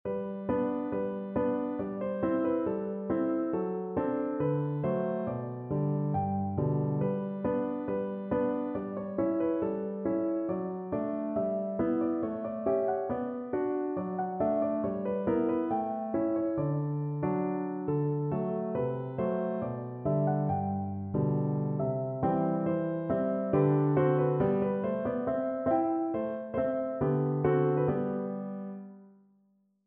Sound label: schubert rose, piano